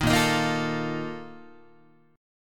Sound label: Bb Major 7th Suspended 4th, Guitar